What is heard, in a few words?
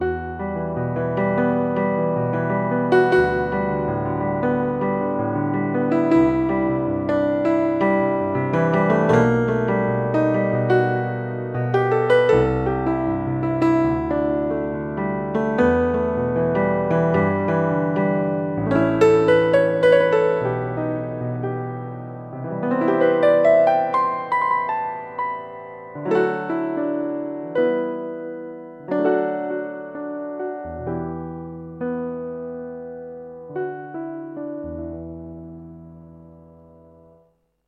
Glass Piano